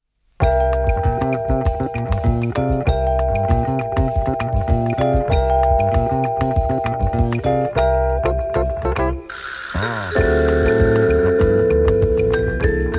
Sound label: Clave Beat